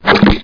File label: LOINK; AVX demo